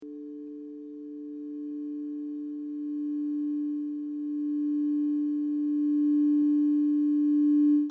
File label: crystal singing bowl tone d; chakra2